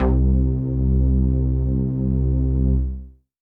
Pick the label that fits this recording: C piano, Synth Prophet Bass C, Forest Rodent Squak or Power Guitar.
Synth Prophet Bass C